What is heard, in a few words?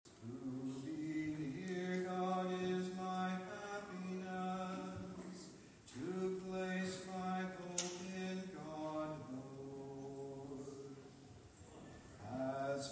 Communion Antiphon